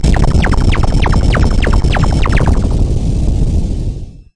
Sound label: boss kill; VIDEO GAMES